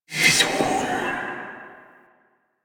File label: Fire Whispers; Warframe; Tenno; Grimoire